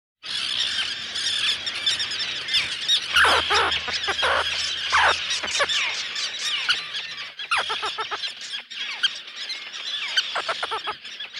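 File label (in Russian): крачки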